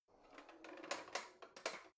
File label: old drawer creaky soft out